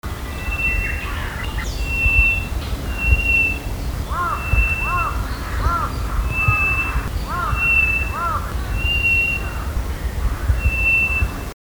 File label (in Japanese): toratugumi